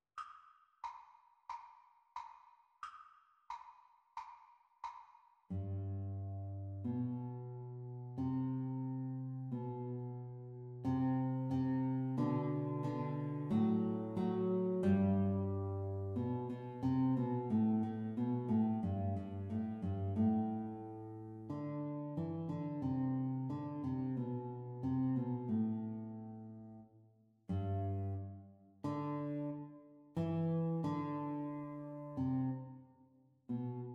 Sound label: Guitar Duet Playalong Exam Speed